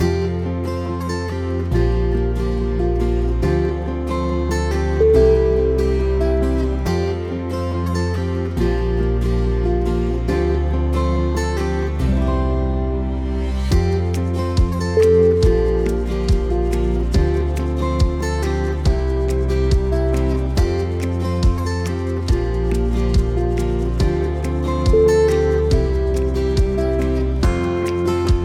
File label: romantic mood